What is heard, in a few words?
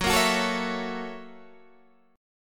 Gb 7th Sharp 9th Gb Guitar Open G